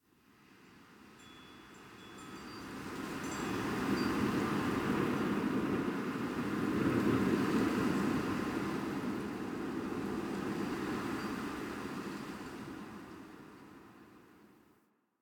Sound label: Exterior Winter Wind One Off; Warframe; Ambience; NineteenNinetyNine